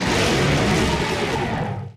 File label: cry; kommoo